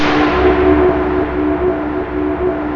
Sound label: CAVE, SPATIAL; East West - Global Sounds